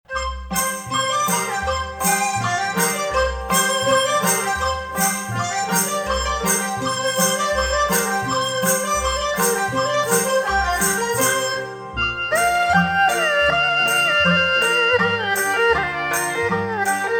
Picking Red Chestnuts (Traditional), Chinese Traditional Erhu Music